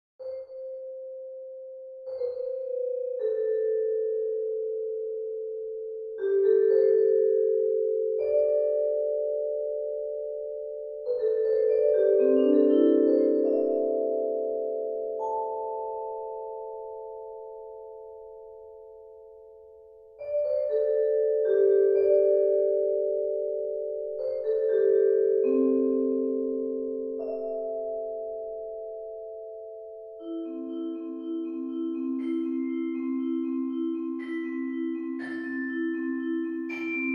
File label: Music fantasy - Forest